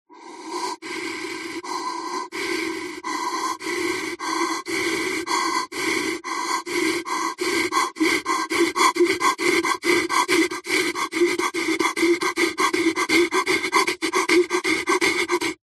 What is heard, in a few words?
human breathing firefighter mask pov fast panic breaths increasing